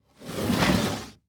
Foley Footstep Cowboy Boots Scrape On Hollow Wood Surface; Foley Footsteps